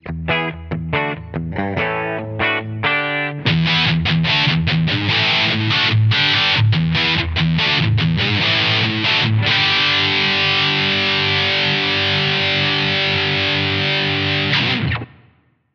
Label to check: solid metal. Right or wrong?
Right